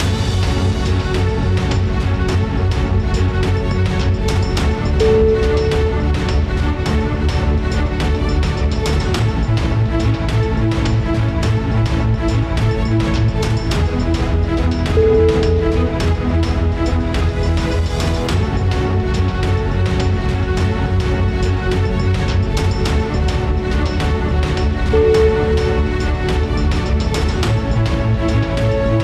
emotional epical orchestral

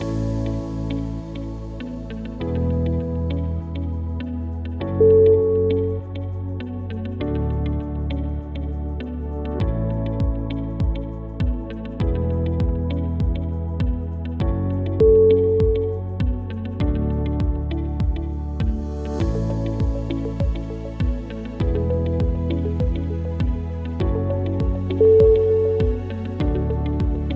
soothing medicine

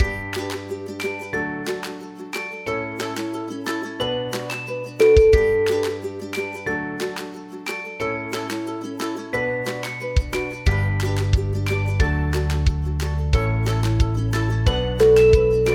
upbeat fun summer

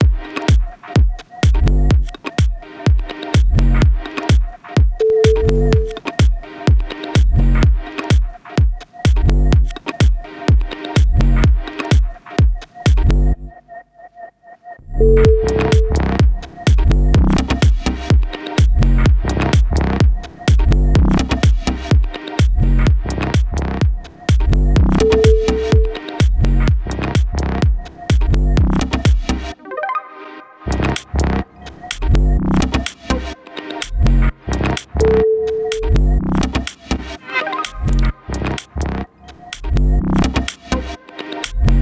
techno digital vibrations

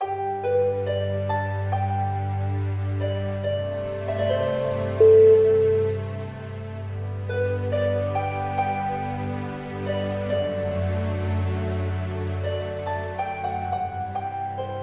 romance for harp

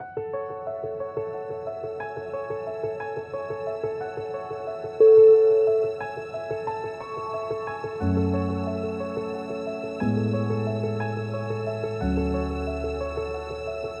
piano spring theme

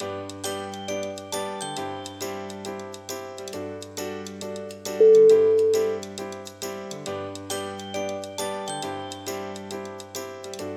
joyful background

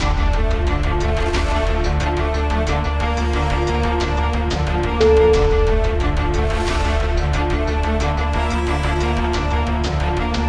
orchestra intro

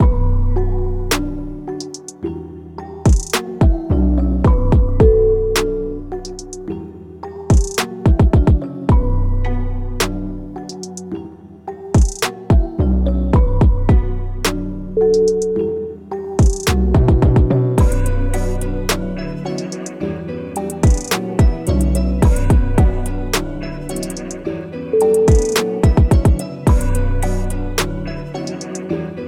gangsta beat

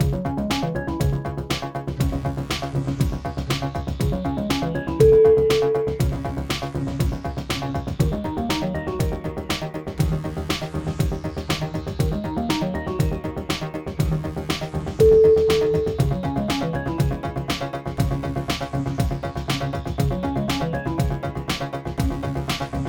technical progress

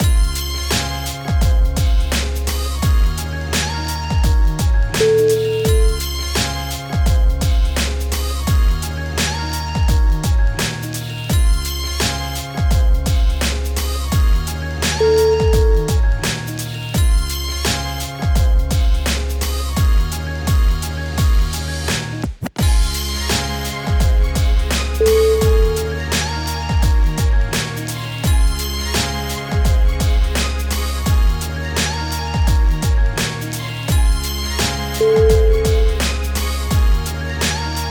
summer beat

